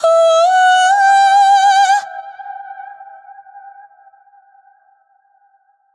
vocal syllable one shot operatic ooh adlib wet, 128, E min